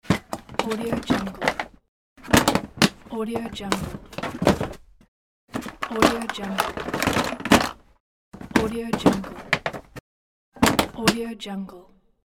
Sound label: tool box